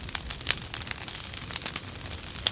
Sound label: fire